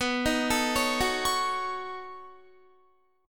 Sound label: B 9th B down Guitar; arpeggiated